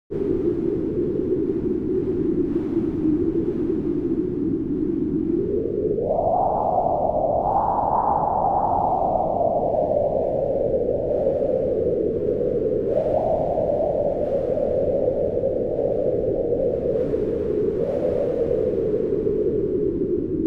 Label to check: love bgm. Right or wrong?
Wrong